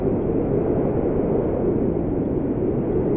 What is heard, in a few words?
wind gust roof; soundscapes